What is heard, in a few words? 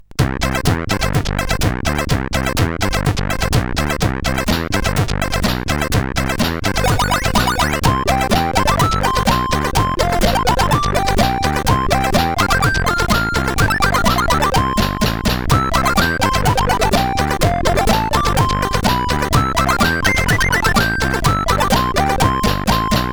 sid CSG8580; Abnormal Life